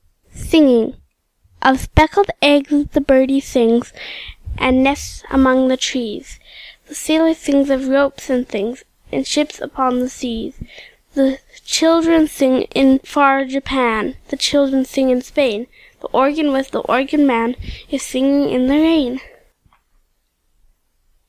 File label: singing